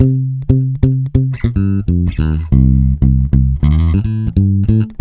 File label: Ultra Freakin' Bass, 96 BPM; Sampleheads